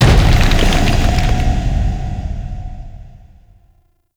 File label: Drifter Pull End; gameplay; Warframe; NineteenNinetyNine; Stage12PartyOfALifetime